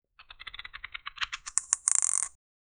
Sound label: games